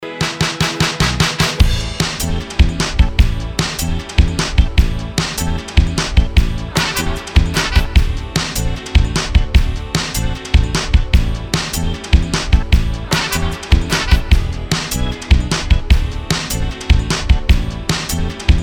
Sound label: Karaoke Song